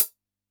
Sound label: HHCL, Yamaha DD-65, HH